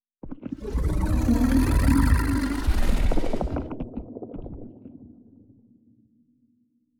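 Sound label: space dragon idle loop; Stellaris; reanimated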